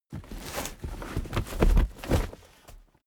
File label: cardboard box big lift handle